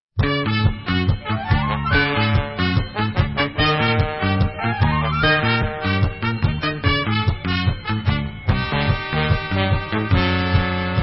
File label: Instrumental